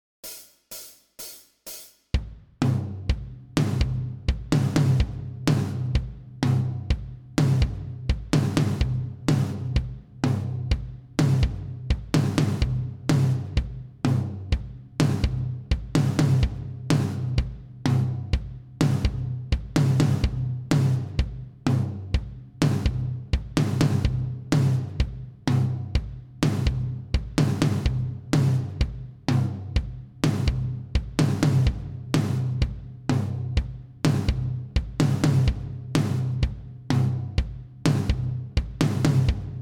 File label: 126bpm, bass